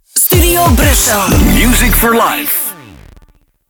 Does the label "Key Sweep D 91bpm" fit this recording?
Yes